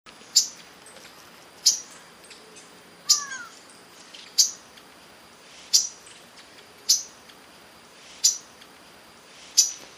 tiê de topete canto

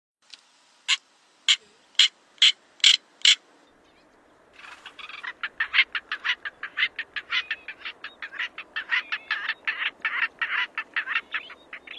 Canto da abetarda de asa preta

